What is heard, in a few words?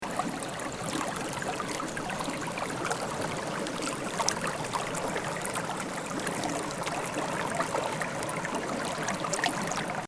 Water